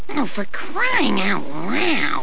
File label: cryin out; ohh for crying out loud!